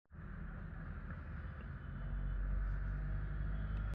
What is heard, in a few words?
silent voice; meeting summarizer